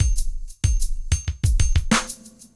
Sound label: funked, 94